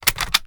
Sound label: bolt open; ssg69; tacz; daffas arsenal